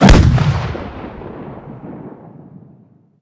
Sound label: launcher; gmod; masita resources workshop content